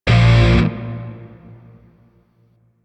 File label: Shawzin Pent Min Chord H; Grineer; DE; Warframe